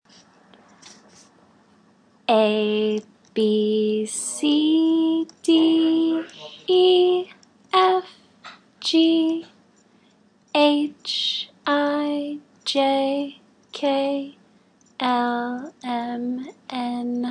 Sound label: alphabet song